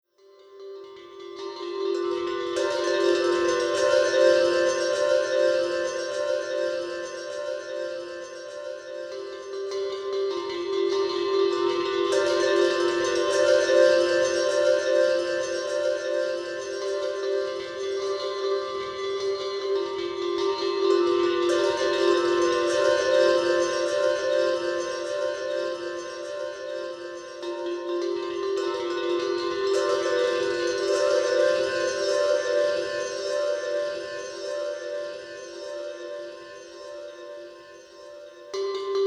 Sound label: Cowbell